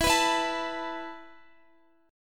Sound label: E Suspended 4th E, down, Guitar, 4E,4A,5D,5G,5C,6F; Esus4; strummed